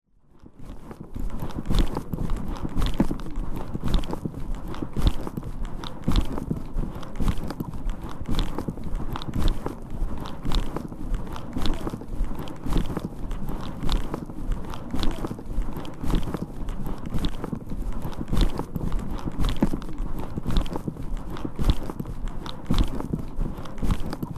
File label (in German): schaben; soundscapes